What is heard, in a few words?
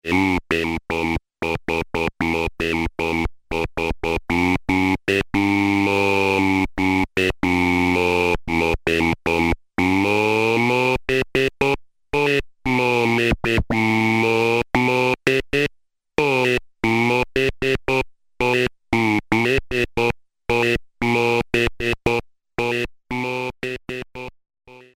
Cyber Chant; Alesis airSynth